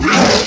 hot molar attack

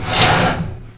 attack; tamruan

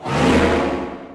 dancing dragon attack

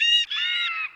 firewind kite die

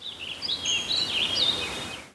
bird

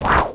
attack

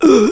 damage

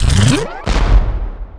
abyss strike

